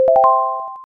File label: correct; Correct (Major); ui